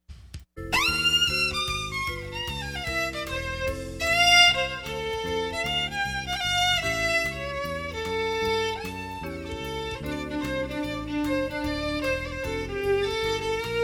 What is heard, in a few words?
solo